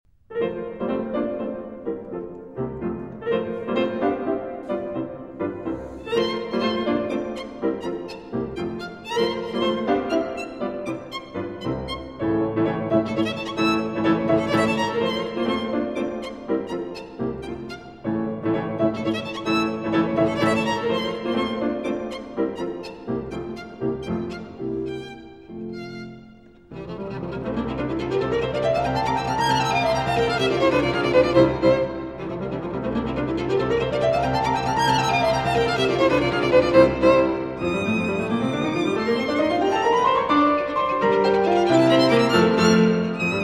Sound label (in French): sonate pour violon et piano en fa majeur; Ludwig van Beethoven; op. 24; Le Printemps; Violin Sonata No. 5 in F